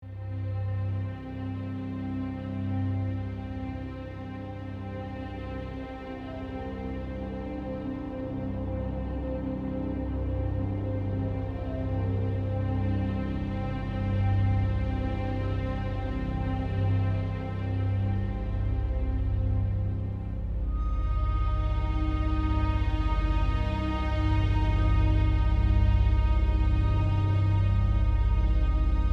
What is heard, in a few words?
Hopeless loop